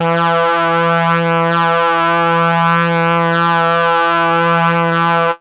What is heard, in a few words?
MEL BRASS; ILIO; Propeller Island; Legendary M400